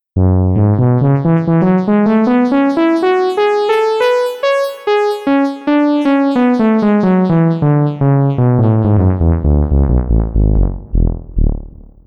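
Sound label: lead brass sound; the transmitter soundset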